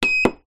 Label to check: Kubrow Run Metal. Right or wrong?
Wrong